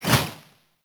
Enemy Death Explosion; Warframe; Caliber Chicks Minigame

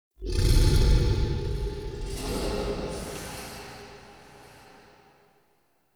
Interior Texture Large Moan, Infested, Ambience; Misc Indoor

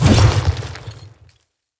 Claw Swipe; Bromius

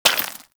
Bullet Impact Debris Rock; Warframe

